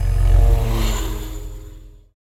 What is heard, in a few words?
COPTER; E-MU Producer Series Vol. 5; 3-D Audio Collection; 3D Multis; 3D Sprint Select 2